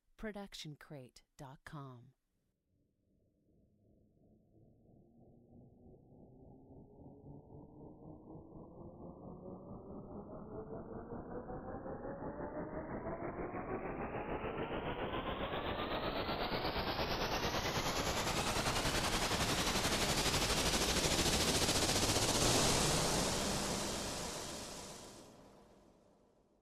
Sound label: Cinematic Riser